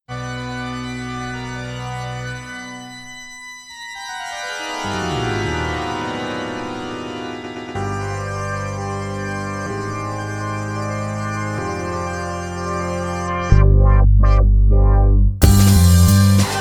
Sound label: Instrumental